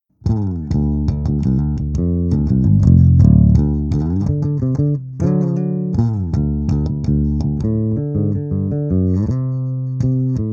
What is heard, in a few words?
Flying V; LWA500